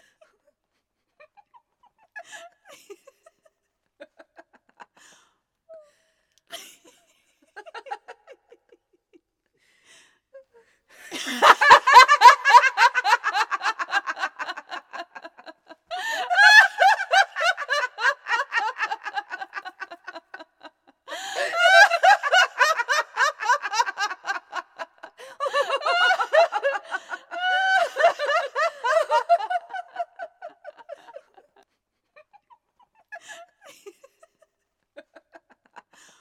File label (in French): Rire